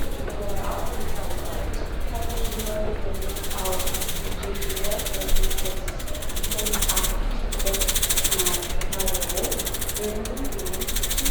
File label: Arrivals Departures Board, airports airplanes; Frankfurt; Sound Scapes